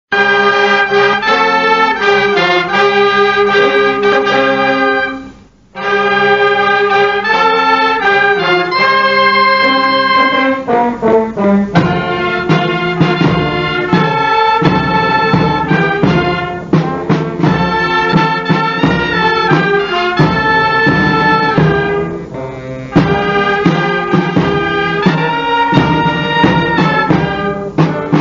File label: Hymne National du Niger National Anthem